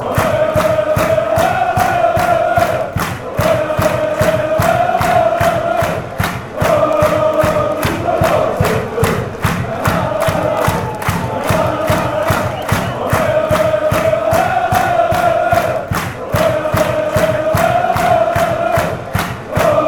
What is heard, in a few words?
wooooaaaa super, fan chants